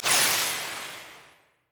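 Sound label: summonerspell base flash return; LoL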